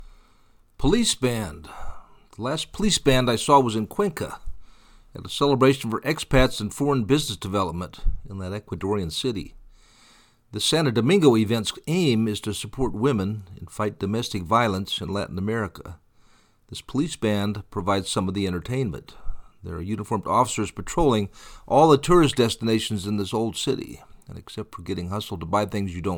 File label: police band